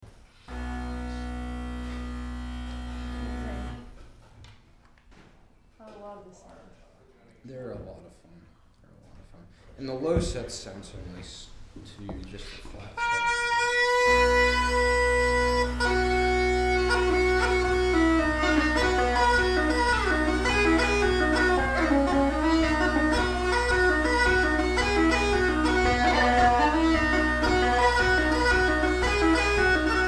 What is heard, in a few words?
Irish Jig